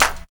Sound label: combi drums